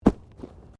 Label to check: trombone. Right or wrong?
Wrong